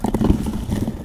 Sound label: Bridge turn